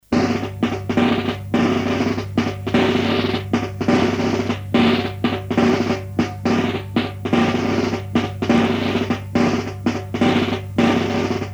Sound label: QuickStep